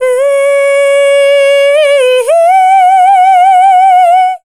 Dance Vocals, FEMALE

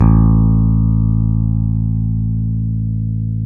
BS P BASS, E. Bass; Roland LCDP02 Guitar and Bass

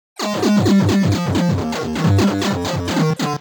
130 C, uk garage, Synths; 130bpm Lines n Loops

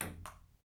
PRC PP, Ping Pong; Rhythm Section; FX Perc

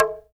TALK DRM, Heart of Africa; Spectrasonics

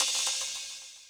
Open Hhat, Hihat; Equipped Music; Loungin House

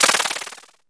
guiro, synth guiros; Zero-G Ambient 1

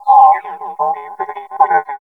VOICE FX, Dance Vocals

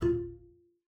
F4, bass pizz; Xsample VOL-5

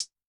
HiHat, Yamaha DTXpress-II

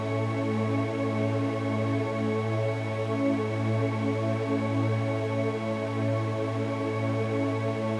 Drone; stereo toolkit samples; Non Tempo Loops

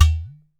UDU HIT, UDU DRUMS; AKAI S6000; WorldSounds

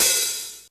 hi-hat; InVision Interactive; 40 Oz Phat Slammin Hip Hop

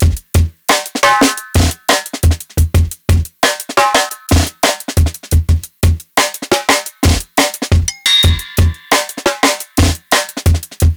Live Jungle Drum Break, 175; JungleBreaks24

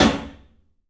CLUB SNARE; 3D Sound; 3D Drums

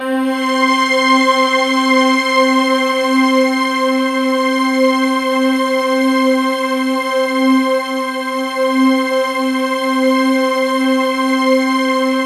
STRINGED; MORPHEUS

